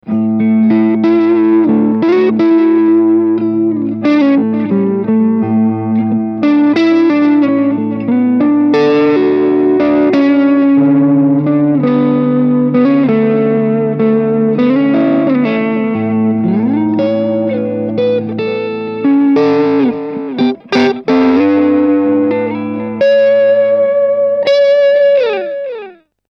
Re 201; Chandler

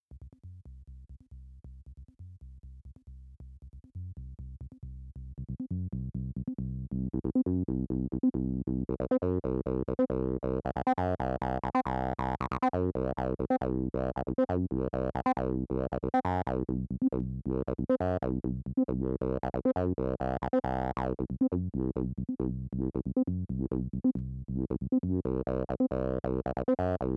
MB33 VCA Accent

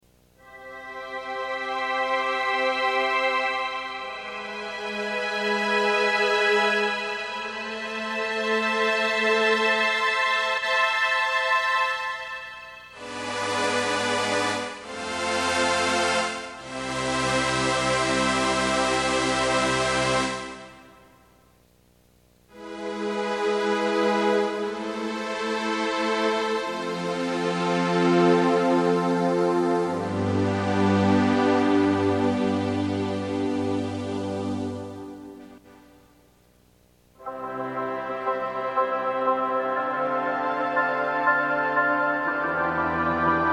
PC3LE Pads

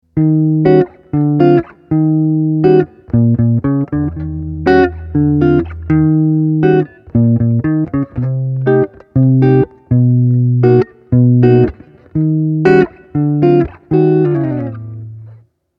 Cube 80X Acoustic Sim Plate

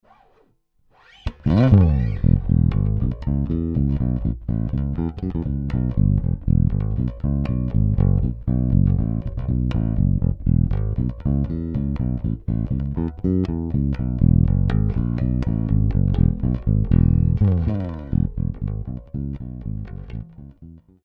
Fingerstyle Neck

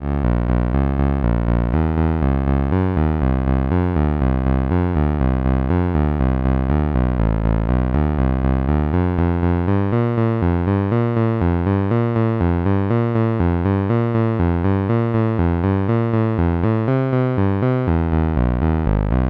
telephone game LOOP; Snazzy FX

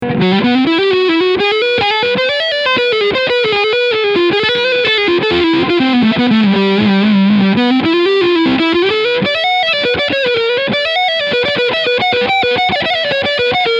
Leadsound Neck HB

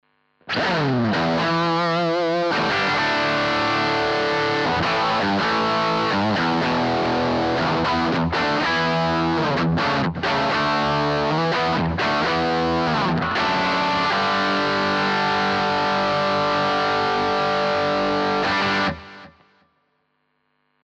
Drive

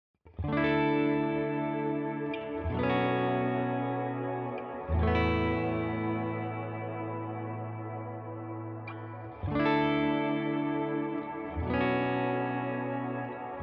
shimmer

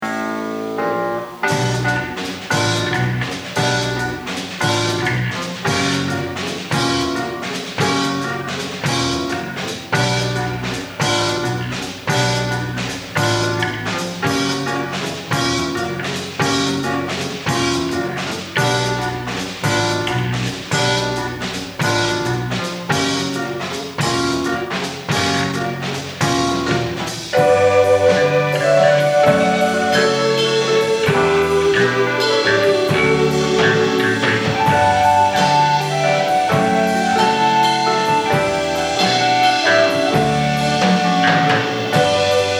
Wurlitzer 200A

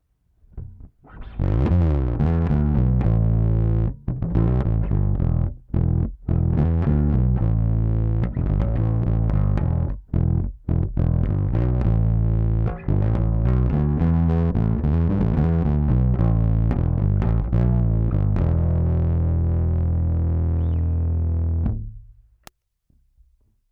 Bass Station Grit

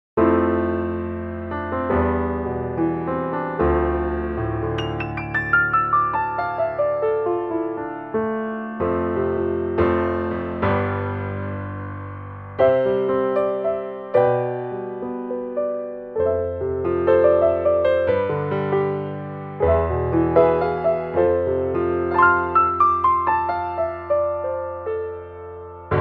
Piano

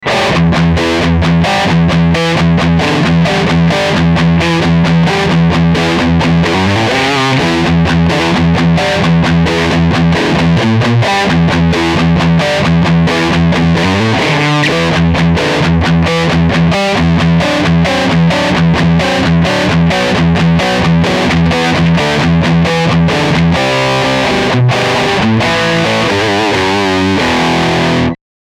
Riff Cabinet Out

